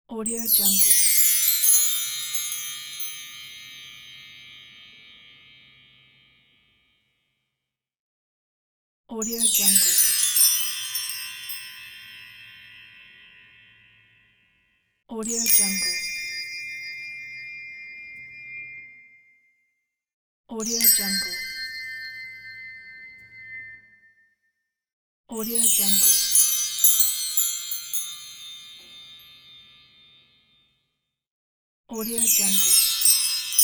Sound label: chimes; pashastriker